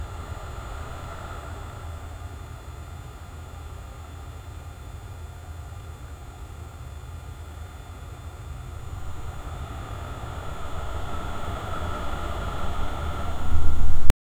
the microphone hums with, broadcast

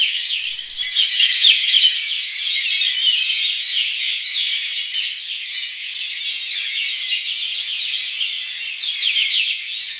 the sound of a flock, disaster

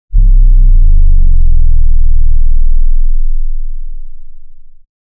hit impact short punchy, punch

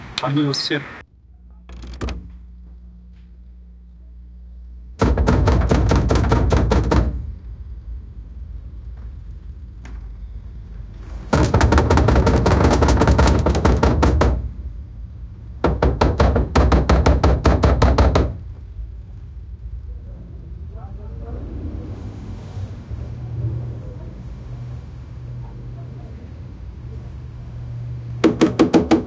loud rapid banging on fro

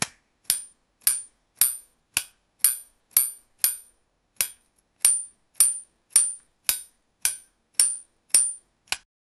bullet bike sound

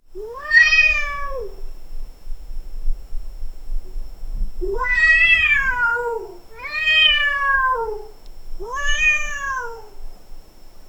a cat meows in the, moonlight

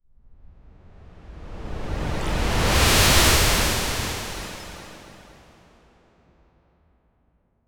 elevate transitions with, transitions

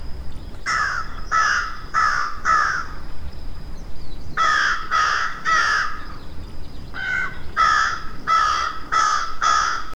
crow mixed with pig and, animal vocalizations

